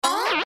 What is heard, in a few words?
toy squeak